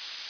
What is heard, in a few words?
spray